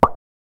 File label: soft cartoony pop or boin